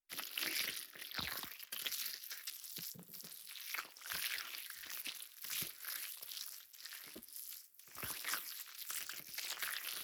スライム娘のお耳掃除 左

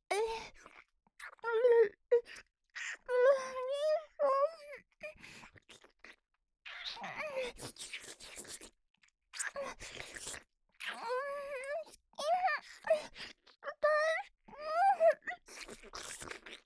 ディープキス 激しめ 好き好き

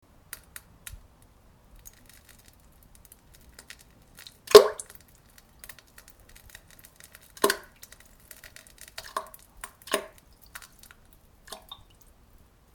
普通 うんこ むちむち 大きめ ぽちゃ ぽちゃ 音

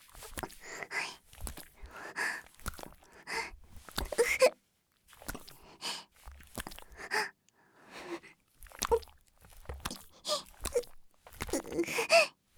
ギシアンループ; あ～にき！いくら出す?

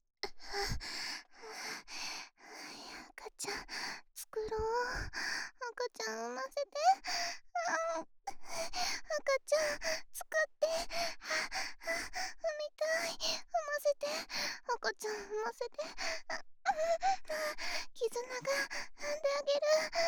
喘ぎ, 左, バイノーラル; 赤ちゃん作ろ♪; DL製作班; ハーレムオナサポ 4人のメイドがご主人様のオナニーをお手伝いするバイノーラル

